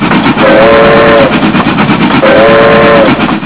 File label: train